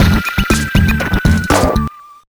Unlocked; the urbz sims in the city; gba; Lunar Base

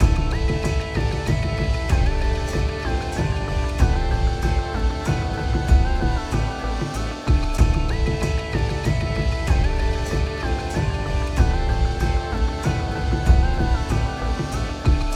Music Tutorial

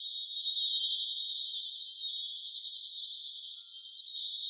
Charge Golden Boomerang; Boomerang Fu

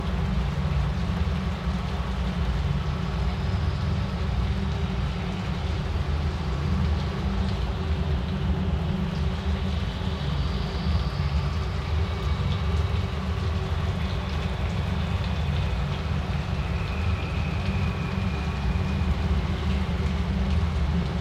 ambience, cave rain

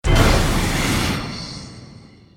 Stinger Action Short

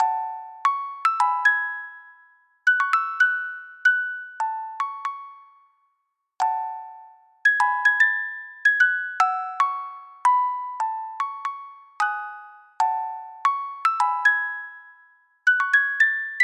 Soul, Music Box; animal crossing new leaf welcome aamiibo soundtrack

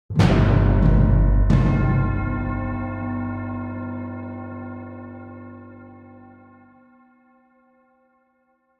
String; Assassin's Creed Unity Dead Kings

